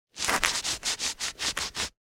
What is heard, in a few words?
Clothes Boot Construction Scrape Concrete